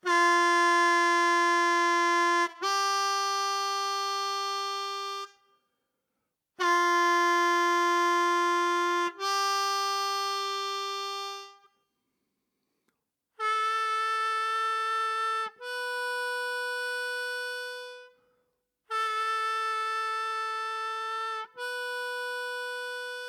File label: Direct Bends In 2-, 3- And 4-Draw, Blues Harp